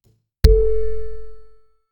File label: short cinematic, logo